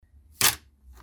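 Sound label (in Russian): a stack of dollars thrown on the table, they scatter in a small fan; Звук купюр, долларов, отдельно и в пачках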